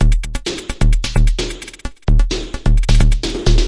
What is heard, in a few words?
analog, drum loops and breaks; 121 to 130 bpm; soundsonic nks analog berlin